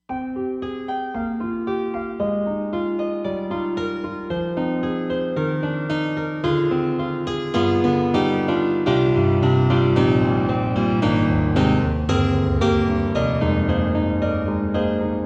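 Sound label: Roland SD-50 Stage Piano 2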